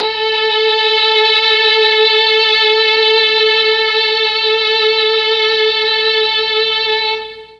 A2 3 violins, Mellotron MKII violins; Mike Pinder - Mellotron Archive CD

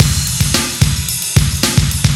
Rock Tweak, Funk Beats

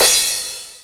Crash, E-MU Proteus-2000

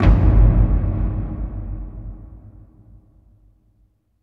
BIG BOOM, Impacts, Hits & Booms; Distored Reality 1&2

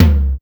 Linn Tom lo

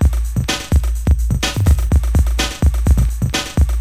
LOOP 126; SLICED4U

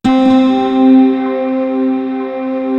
CANYN, 01W BELLS; East West - Global Sounds

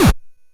Drums, Hits; DCM8

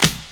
SD, Dance Drums

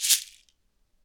SHEKE, Afro Shakers, Shekeres

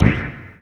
CYPUNK, DRUMS PERC; Q Up Arts; Dream Experience

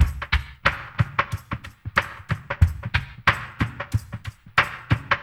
CAVERN, Liquid Grooves; Spectrasonics; Liquid Grooves 1 & 2; CAVERN SW

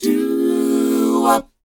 DOWOP D#; ILIO; Vocal Planet

